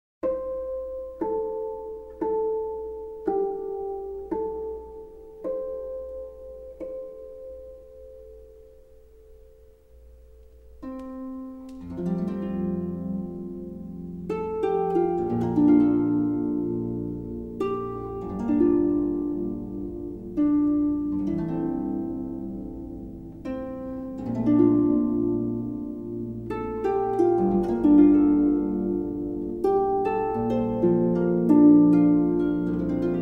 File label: Instrumental Harp Praise; Amazing Grace-Jesus loves me